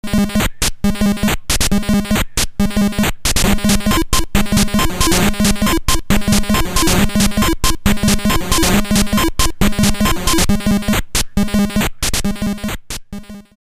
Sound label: Synthcart